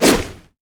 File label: wolfman crit attack; LoL; warwick